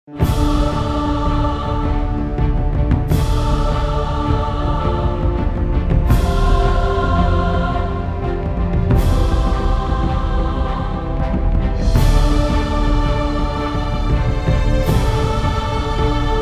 generic piece of orchestrated music

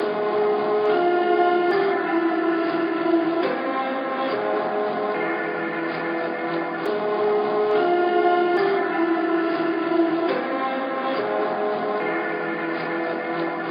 StringPad; 140BPM; Pony Fan Music Remix Stems

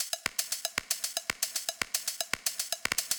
drum; Pony Fan Music Remix Stems

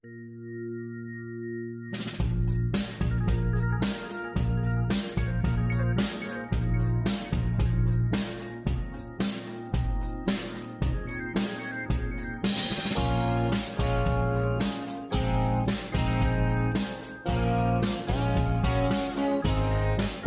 Belting, Karaoke, Running out of breath, F

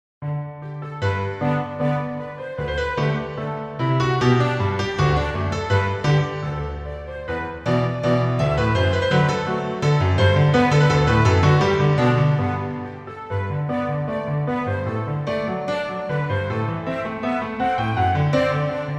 Orchestral Arrangement

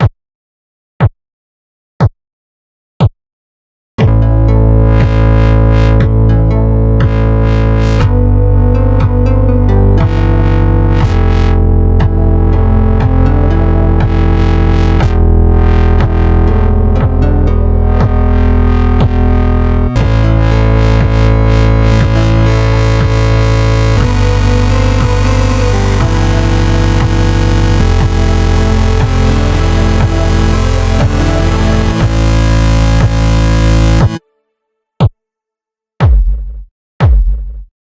Song of Storms Mini Dubstep Remix

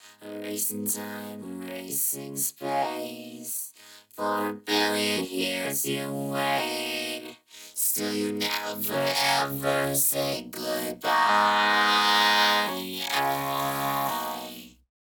build backing; 128BPM

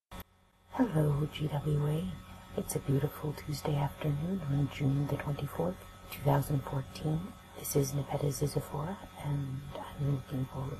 Introductory Noise

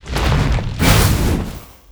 Monster; Zenless Zone Zero

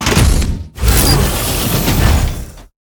Monster; Zenless Zone Zero

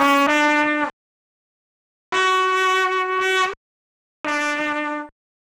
trumpet DRY

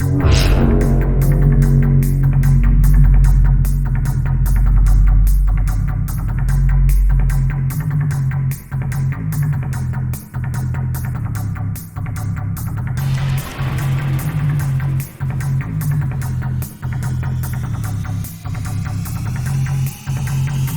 remix

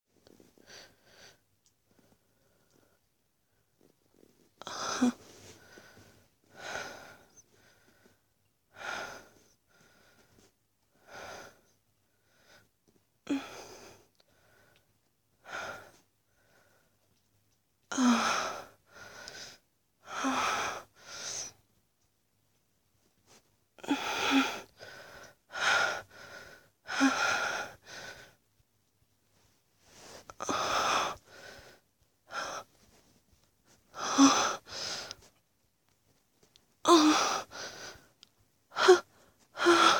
Playing with My Glass

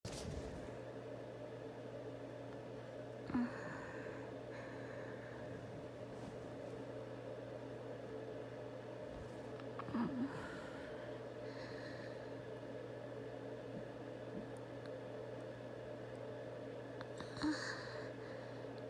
whimper

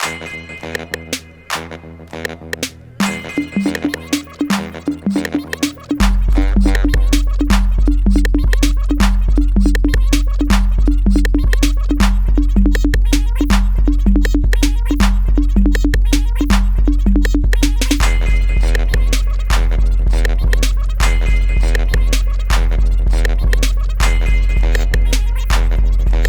Squirrel Funk; Pony Music Archive 21.03

